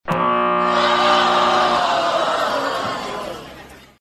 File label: buzz groan